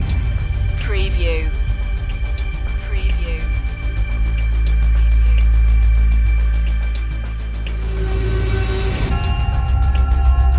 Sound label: short thriller trailer loop